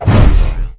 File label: health